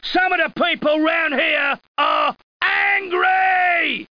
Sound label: rioter